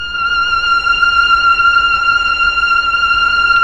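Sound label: strings Mellotron violin, slow violins; Roland LCDP09 Keys of the 60s and 70s 1; CMB Melo.Combo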